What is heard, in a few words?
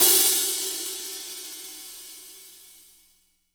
CHH L-L, ROCKER KIT